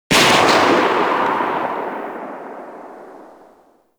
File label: Shot Tail, Weapons; Warframe; Gorgon